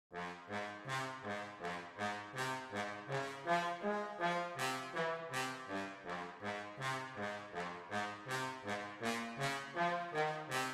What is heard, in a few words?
Trombone